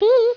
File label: BEE